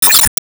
Fistball Fall

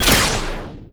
Enemy Ufoblock Common Delete; gamerip

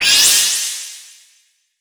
New Member Notice; D4DJ Groovy Mix; Android, iOS, Mobile

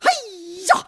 Kungfu; Gong; Neighbours From Hell Console Edition; GC, Xbox

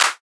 Clap

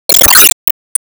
Sp Fistball Finish

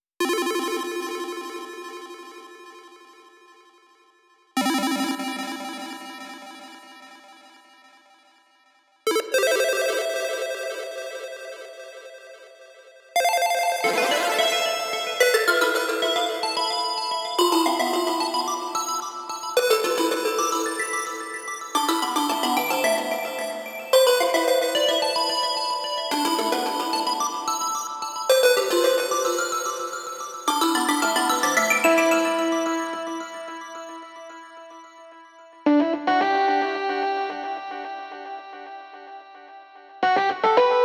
Tropic of Cancer Synths 110

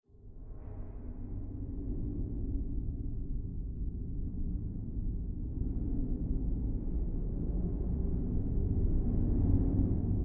underwater noise; underwater noise4

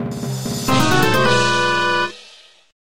Jingle Battle intro; Kingdom Adventurers; Android, iOS

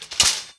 Cycle Crash; Fitness

